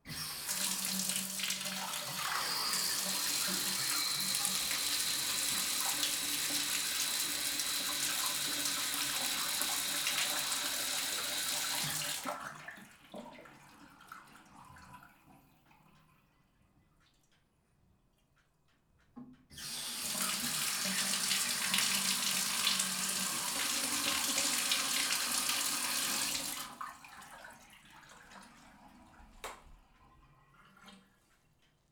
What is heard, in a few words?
Bathtub On Run Off Drain; Fairlight Sound Lib